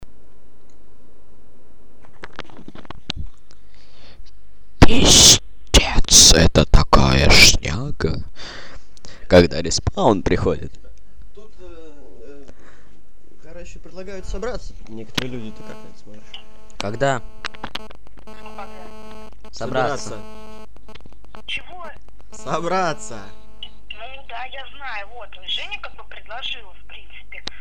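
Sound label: bell; Zvonok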